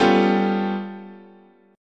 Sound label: G-9th Flat 5th, chord, guitar